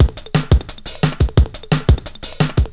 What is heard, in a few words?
175 D&B Drums